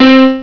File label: piano